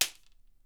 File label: WHIP; Miroslav Vitous - Percussion & Harp